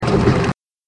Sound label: spin